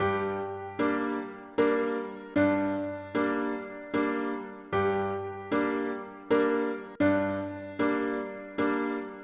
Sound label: a firm judicial cadence quicker